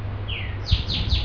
minimize; peach

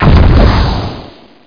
fire, weapons

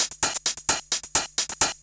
drum; 130 BPM

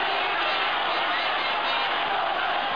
hooter

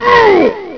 death; Games World; Quake; aamoon; PLAYER

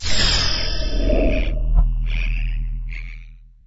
connect; Ubisoft; Redline

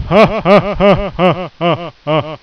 CHACHA

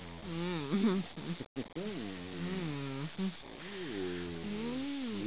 moan; 12000 Graphics Pack - A Complete Graphics Collection For Your PC

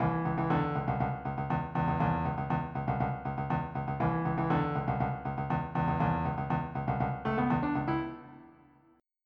Funk

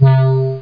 synth

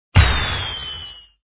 grenades

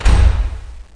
end turn; Warlord3

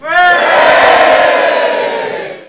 crowd

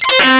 violin

